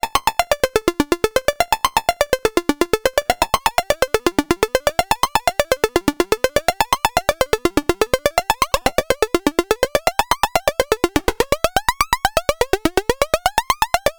OSC Sync Sounds Perkussiv; GRP Module

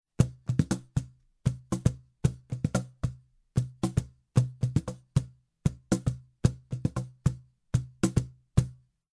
Cajon Groove Shure SM 57 Front Pitch Control zu Cajon unbearbeitet

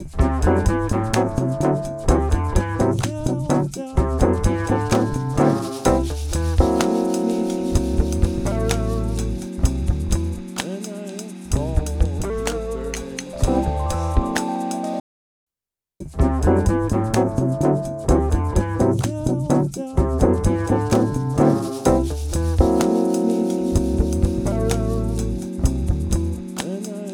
STEREO BUS eqing Beispiel Volle Sättigung

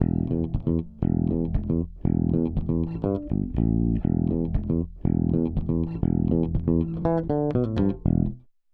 Rupert Neve Designs 5254 Bass 8 zu 1 Fast GR 15 dB Parallel 50 Prozent

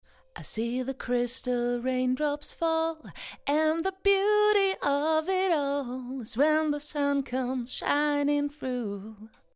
Kompressor 20 1

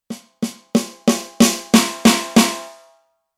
Dynamik Snare

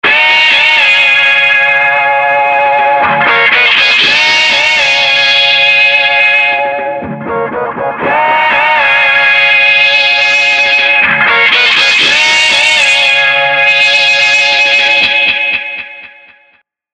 E-Gitarre; Angry Echoes

